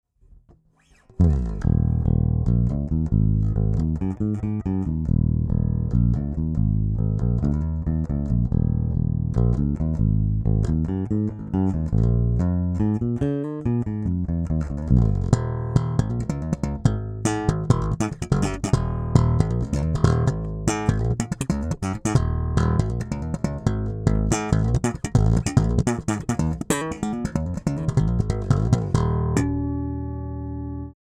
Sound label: Dry; SR KAOSS